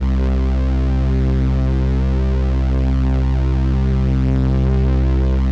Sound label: 505, String Machines